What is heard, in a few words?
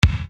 Bass Dr, EuroDance Bdrums